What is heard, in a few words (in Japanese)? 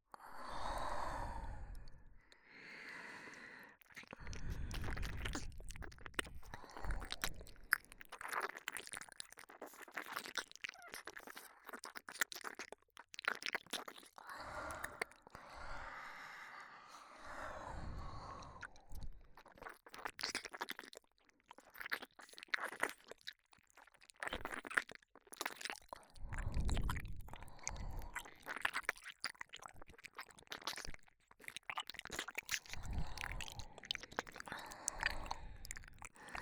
両耳舐め